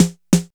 KIT, DRUMS & PERC

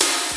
REV SD, TB303; Crypton - DrumBase5000; DANCE3+TB303

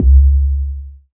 BD, BASS DRUMS; EdgeSounds

